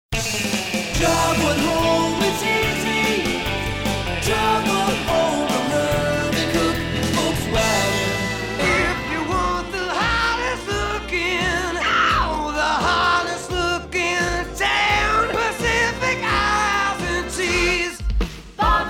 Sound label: Jingles